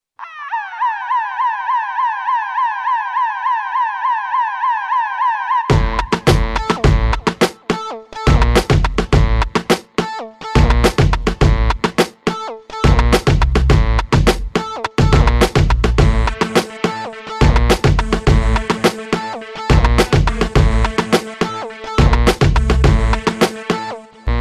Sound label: police rnb